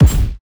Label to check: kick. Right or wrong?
Right